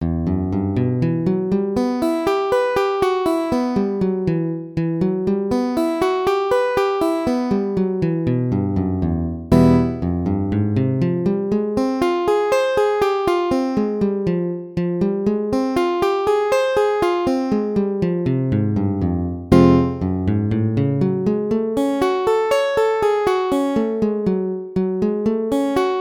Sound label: minor arpeggio daily; gipsy jazz